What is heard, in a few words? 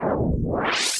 Charge; Touhou Jitsuzaisou Dream Logical World